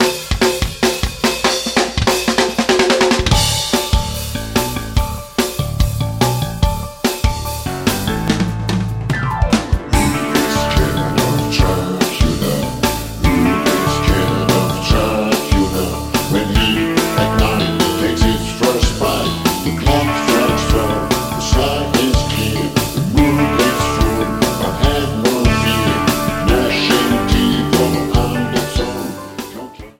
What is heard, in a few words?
Dracula Rock